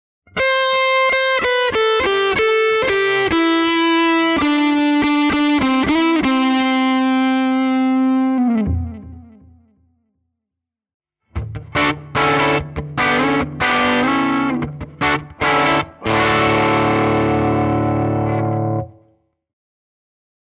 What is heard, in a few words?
Top Boost Channel AC10TB; 1965; 1965 acey10